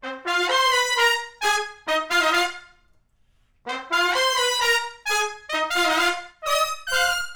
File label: trumpets